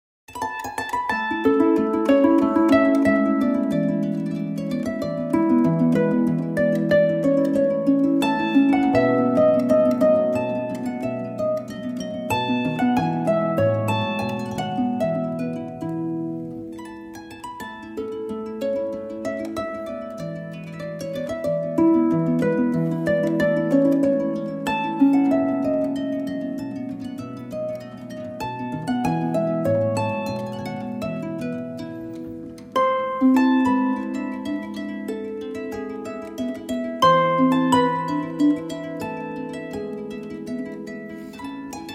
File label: Minuet